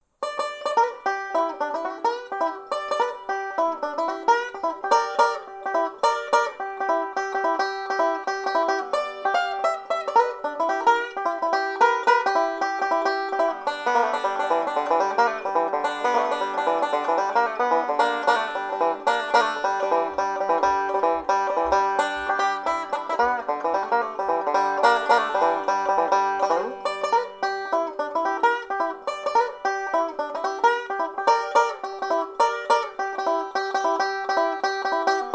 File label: banjo